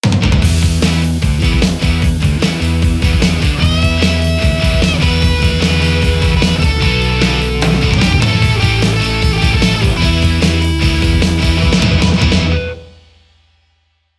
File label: vintage marshall; softube